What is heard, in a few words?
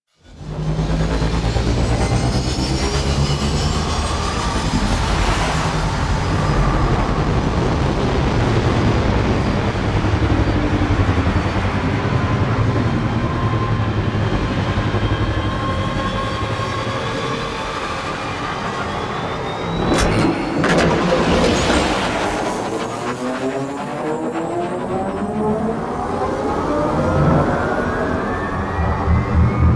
Rail Jack Launch Engines; Warframe; Rail Jack Dock